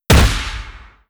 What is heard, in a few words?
destroy layer, brickwall, destruction